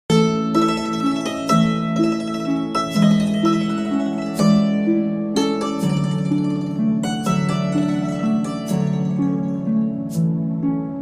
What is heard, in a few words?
song instrumental music classical music